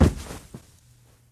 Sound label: Body Thud muted; friday the 13th killer puzzle